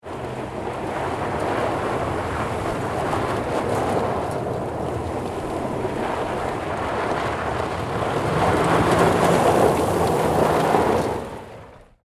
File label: Car Ride Gravel, Transportation, Automobile, Car, Ride, On, Gravel, Rough